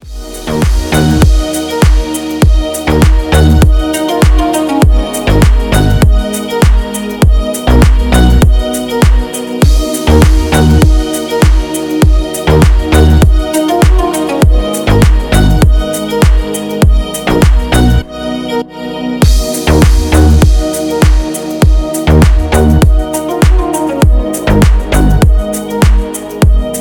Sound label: instrumental